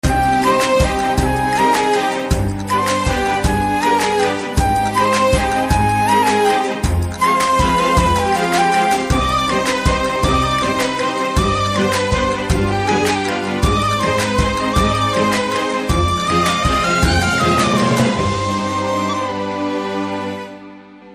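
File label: Love background music Ringtone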